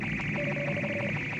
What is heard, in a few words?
Scanner Jammed; BSG